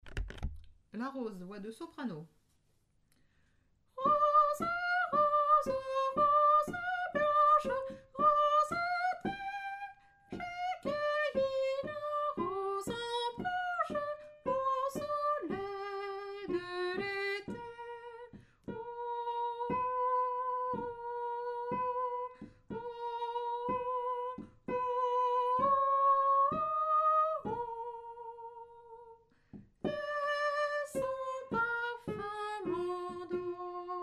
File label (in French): la rose soprano, choeur femmes